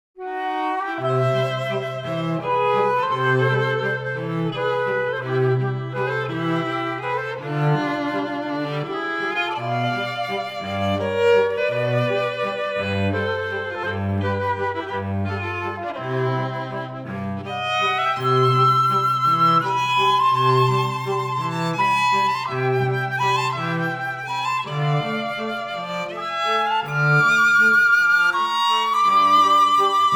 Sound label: Wiener Blut Waltz